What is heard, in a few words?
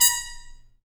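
brass trumpet mute stab, Harmon stabs; Harmon Amb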